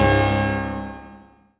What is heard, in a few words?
HOE DOWN, SYNTH; Keith Emerson Signature Series